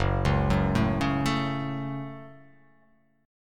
F sharp Minor F sharp, Chord

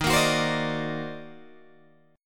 Eb Minor Major 13th, Guitar, DADGAD

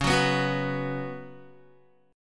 Db 7th Suspended 4th Db down Guitar; strummed

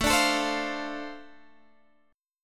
D-Minor 7th A, chord

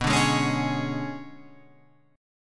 B Minor 6th Add 9th B down Guitar Open F; Bm6add9 strummed; JGuitar

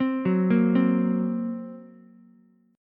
F sharp Major Flat 5th F sharp Ukulele; F#Mb5 arpeggiated

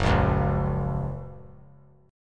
F sharp Major; strummed; JGuitar

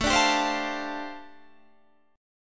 G 7th Suspended 2nd Suspended 4th A

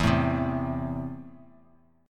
E Suspended 4th Sharp 5th; strummed; JGuitar

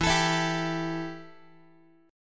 E Minor Double Flat 5th E Guitar; strummed; JGuitar

